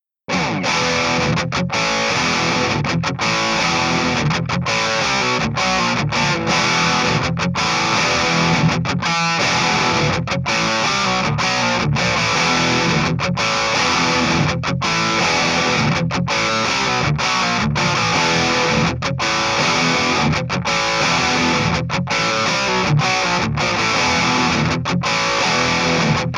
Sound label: JCM Mesa EQ III, Real Amps